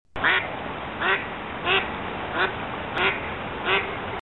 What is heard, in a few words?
mallard, Ducks; Birds